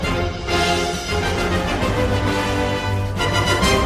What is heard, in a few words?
Fanfare; Bazelgeuse